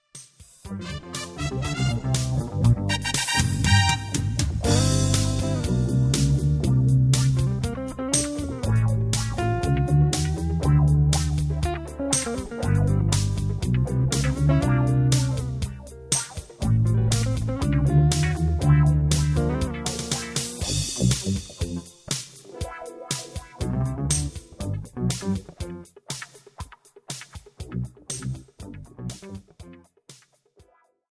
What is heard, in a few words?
acquatic funk